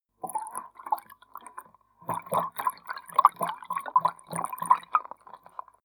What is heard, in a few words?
flowing submerged small burbling gutter